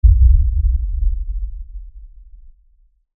LFE Explosion, Lfe Explosion Low Bass Blast Rumble; Blastwave